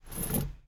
driver foley wheel turn; Blind Drive

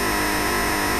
Sci-fi Door Loop; SFX Kit; Sci-Fi Loops

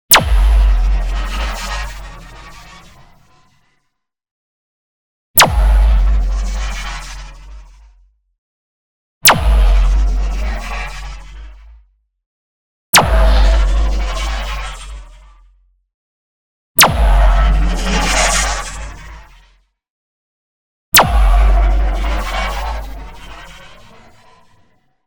NEON ZAP Rising Flash; boom library

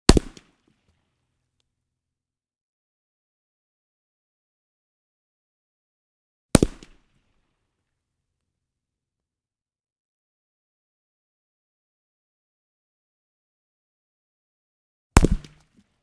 Bullet Hitting Watermelon; Hollywood Edge; Heavily Armed

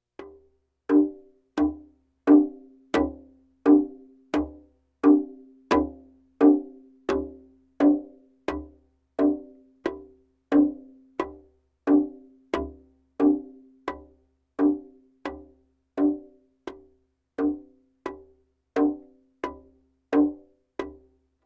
Percussion Bongos Tympani rolls Gongs Drums

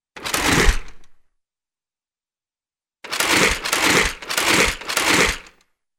Short Mechanical Scrape, cartoon; Hollywood Edge; Cartoon Trax

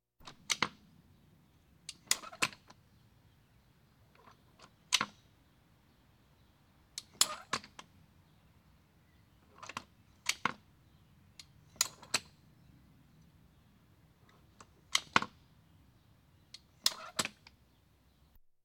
Police, Fire engines

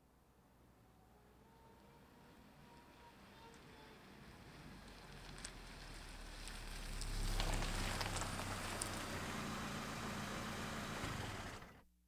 Automobiles various

